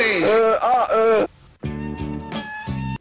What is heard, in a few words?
uh ah uh music